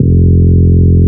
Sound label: Root F, Bass